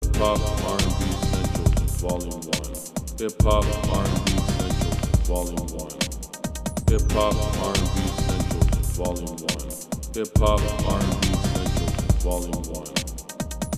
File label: HIPHOP RB